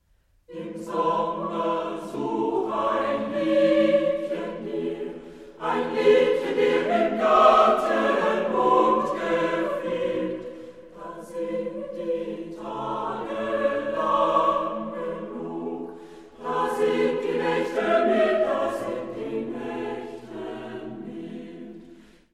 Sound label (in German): Chorlieder der deutschen Romantik